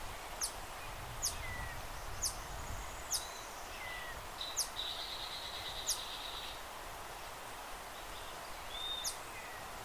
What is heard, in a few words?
Frilled coquette